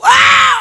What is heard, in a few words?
SCREAM